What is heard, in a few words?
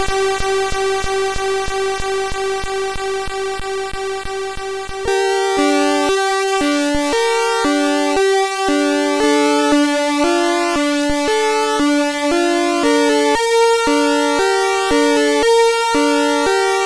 Fantastic tune 2 sid CSG8580R5